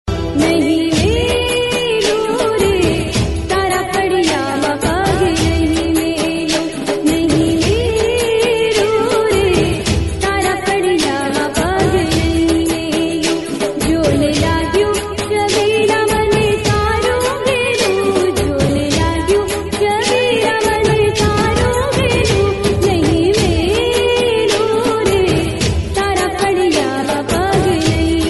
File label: Gujarati Garba Ringtone; ringtones